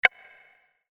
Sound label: Creepy Roll Over